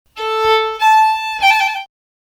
Short first long second pattern, acute accent on high A